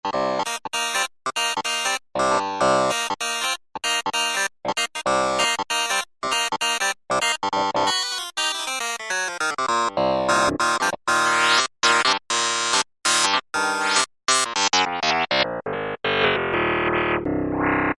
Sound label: E-mu Morpheus, Klav; KlavKlip